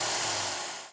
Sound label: hoover Stop; Singles; French Version